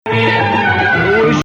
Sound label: Nawa Athar Gameel Rast; same verse ending for all three verses